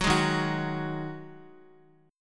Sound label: Eb Minor Double Flat 5th Eb down Guitar; Ebmbb5 chord; JGuitar